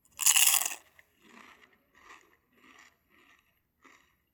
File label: chip crunch chewing closed; soundscrate